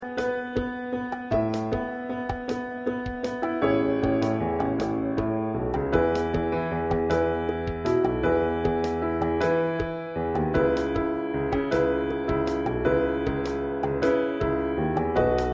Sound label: Accompaniment